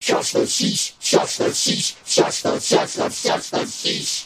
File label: shush the sheesh sound effect